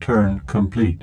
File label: cpu turn complete